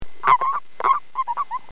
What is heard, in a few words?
Bufo bufo; starnberg